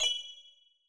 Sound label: back style 5; inteface sfx pack 1; Back tones